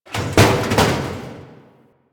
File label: Destruction Small Metal Hits; Ambience; Warframe; Corpus Railjack